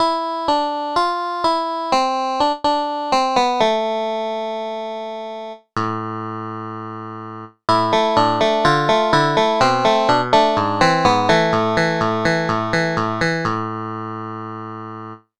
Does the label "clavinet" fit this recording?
Yes